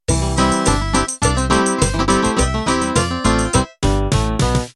Country 2 Step Intro

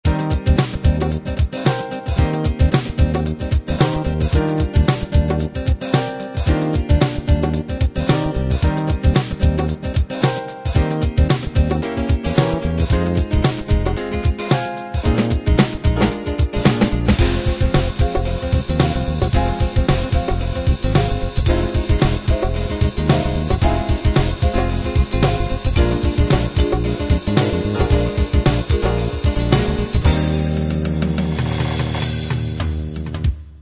jazz hiphop

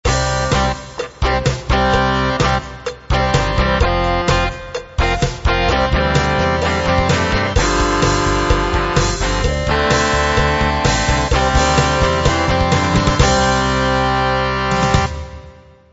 open rock

